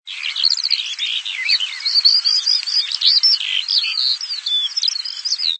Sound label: skylark